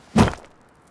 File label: miner hit; jobs